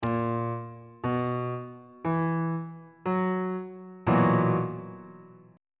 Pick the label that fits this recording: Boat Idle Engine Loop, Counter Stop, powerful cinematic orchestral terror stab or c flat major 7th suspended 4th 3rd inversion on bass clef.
c flat major 7th suspended 4th 3rd inversion on bass clef